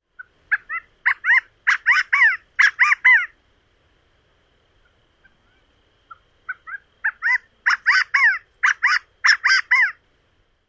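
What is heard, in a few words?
song, California Quail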